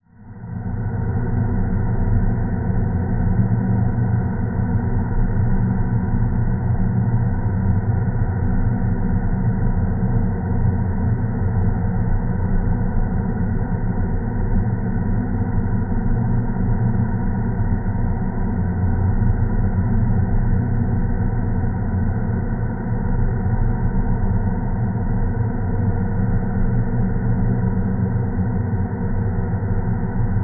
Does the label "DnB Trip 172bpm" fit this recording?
No